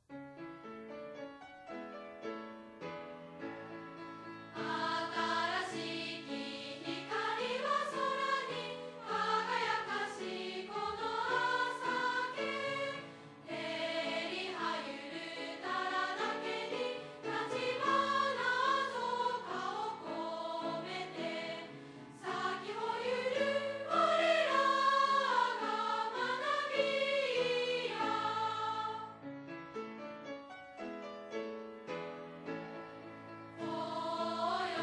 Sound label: school song